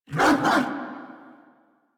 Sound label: dig bark, Kubrow; Enemies; Warframe; Tame